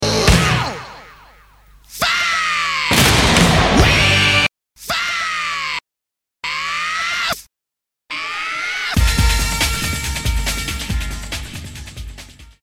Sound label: Scream